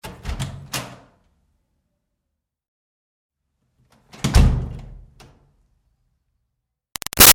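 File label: Door Wood Church Basement Room Open Close Medium; Miscellaneous Doors; Door Wood Church Basement Rm1 O-C Med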